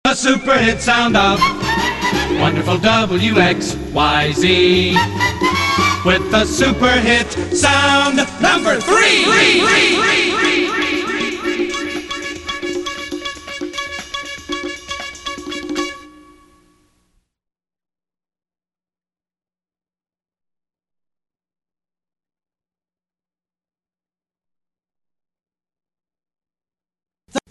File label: Super Hit Sound